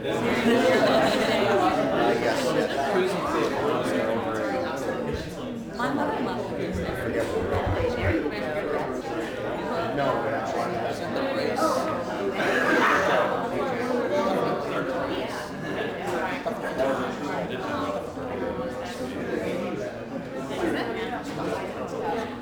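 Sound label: Boxing Crowd Small Ambience Loop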